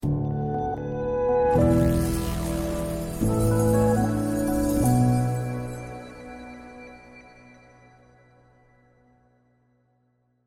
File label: Company Fusion Ident